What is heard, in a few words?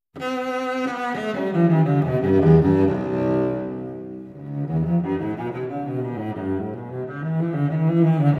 Cello